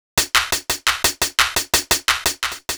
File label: Hi-Hat Clap Combo, Hi-Hat Loops; KJ Sawka - Drum n Bass & Beyond; Drum Tool Box